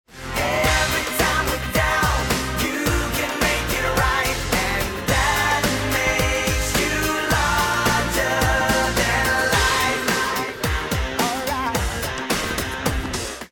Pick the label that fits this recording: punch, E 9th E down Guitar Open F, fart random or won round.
won round